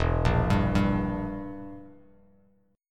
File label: E Diminished E, Bass Standard; Edim arpeggiated; JGuitar